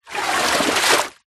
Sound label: nature water whoosh pole swipe fast surface splash